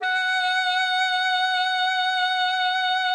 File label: ALTOSAX F#5; Xsample VOL-2